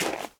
snow step; Golden Crayon Sounds

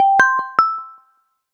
Drop In Start Tone; iOS Sounds

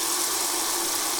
spray; Golden Crayon Sounds

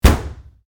wall car; Golden Crayon Sounds

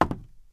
wood step; Golden Crayon Sounds

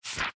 menu wrap; Golden Crayon Sounds

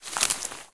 thick forest step; Golden Crayon Sounds

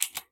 keyboard delete; Golden Crayon Sounds

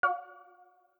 On Off Passcode Failure Haptic; iOS 27

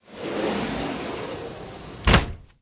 door close; Original TK Sounds

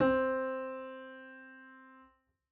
piano c; Golden Crayon Sounds

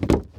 wood land; Golden Crayon Sounds

